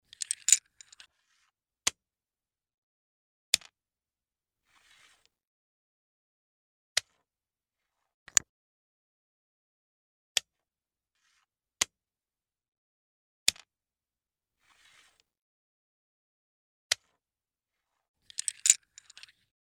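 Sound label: dominoes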